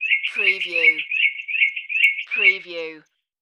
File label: Mocking Bird Call, bird